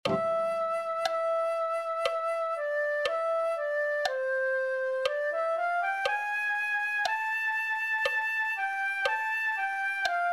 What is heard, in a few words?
flute 1